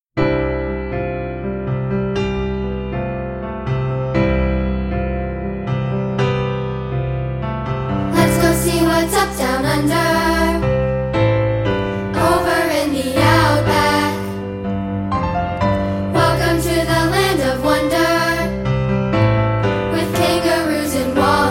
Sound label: piano